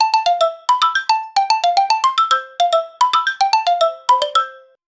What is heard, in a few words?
short funny back, loop